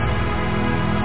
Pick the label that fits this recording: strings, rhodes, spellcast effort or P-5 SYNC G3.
strings